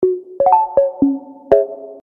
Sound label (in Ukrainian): korotka melodiia na sms